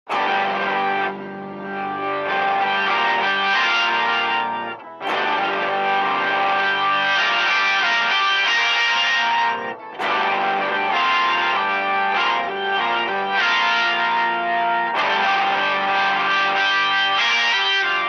overdrive Hammond guitar; POG

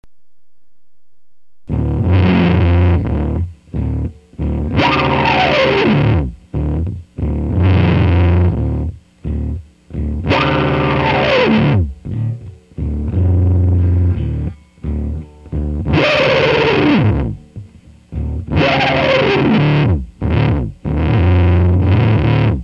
Tube Zipper

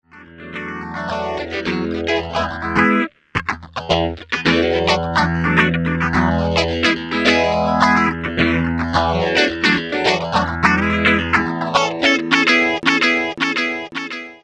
Small Stone

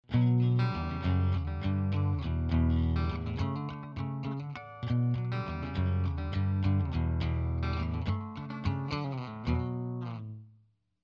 bridge clean; EMG-SA

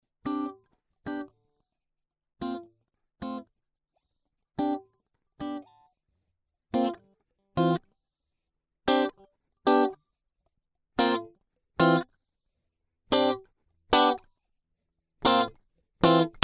Multi Dly

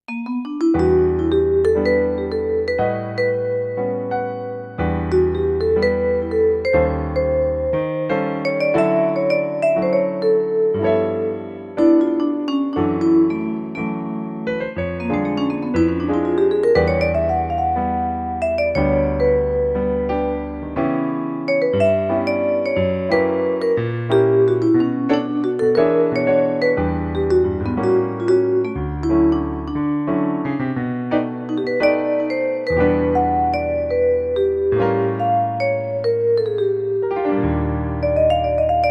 Vibraphone; YAMYDP113

